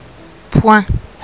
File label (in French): poing